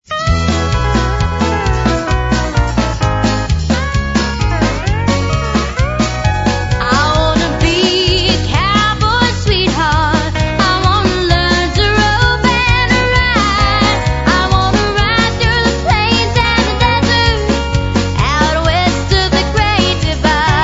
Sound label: Picnic Polka